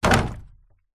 industrial 10 litre plastic drum water heavy set down